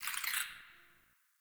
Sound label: Tree Critters; Warframe; Duviri